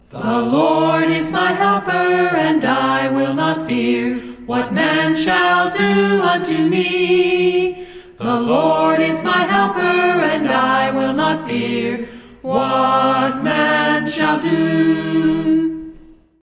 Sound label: a capella scripture song; hebrews 13 6